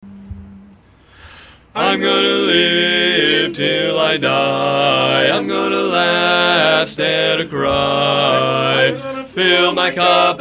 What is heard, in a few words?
Gonna Live bass